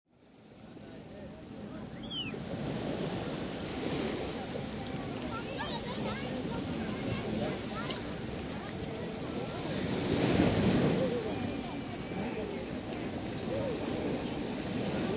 Day at the Beach: Slow ocean waves wash in, occasional birds tweet, childre; Day at the Beach: Slow ocean waves wash in, occasional birds tweet, children and adults play and swim, people walking by and talking